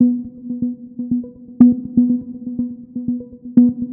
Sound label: 122 BPM Sequence Cmaj Amin Tonic B; innovative sequences 1